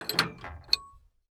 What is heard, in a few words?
Wrench Use; Treasure of Nadia